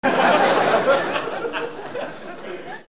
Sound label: laugh